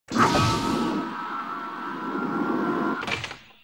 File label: shuttle door; TNG